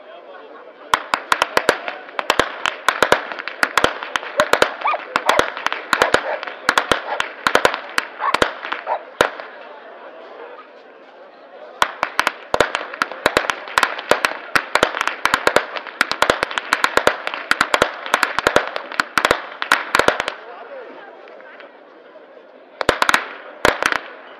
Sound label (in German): schnalzen, flachgau